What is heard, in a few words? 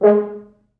4 FrHorns